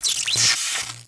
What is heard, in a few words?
Mech Spark; Sid Meier's Civilization 4 Complete; Beyond the Sword; Units